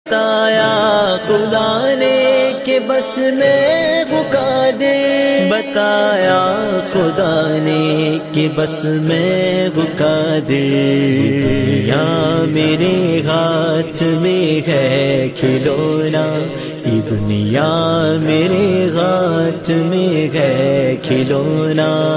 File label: Ringtone Naat